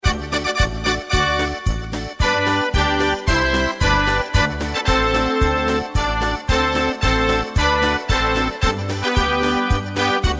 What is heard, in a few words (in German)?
Marsch